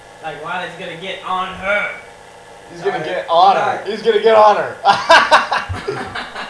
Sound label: haha